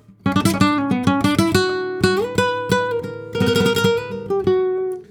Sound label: BPM 94 Bm Flamenco Flame Lead Riff